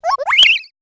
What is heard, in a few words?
Death - Fall; Ordia One Finger Platformer; Android, iOS